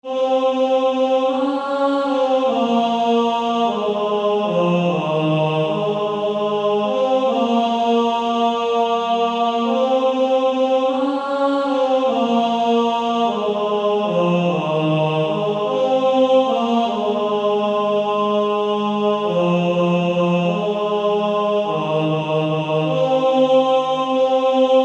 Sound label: Male Choir; Tenor; Only a step to Jesus